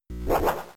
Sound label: Rollout; Pokemon; Gen 3; attack moves; RSE